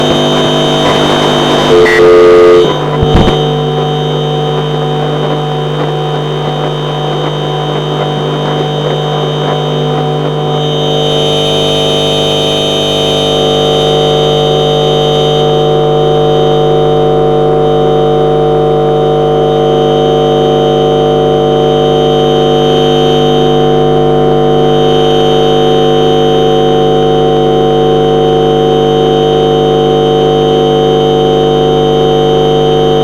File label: recording induction loop journey around home